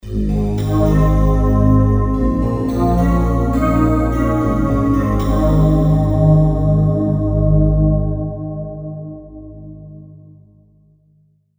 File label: Pad Attack